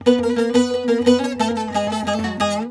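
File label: G#, bouzouki; USB Soundscan vol.53; Mediteranean Traditions; AKAI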